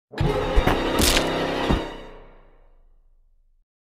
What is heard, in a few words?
neck twist kill; Among us